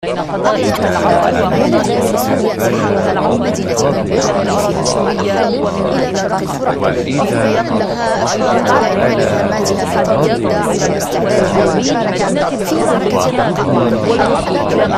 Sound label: arabic mix